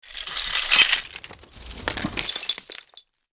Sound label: Glass shards and debris